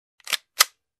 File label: Reloading pistol